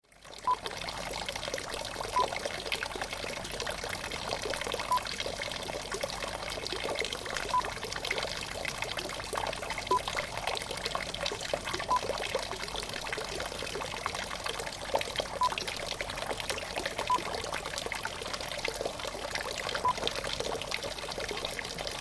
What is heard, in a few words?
Water Fountain